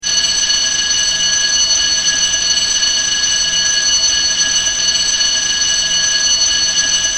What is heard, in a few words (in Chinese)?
电铃; 钟声; 广播室铃声系统